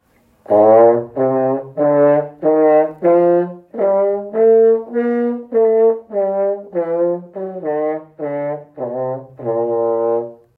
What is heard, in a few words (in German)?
So klingt das Horn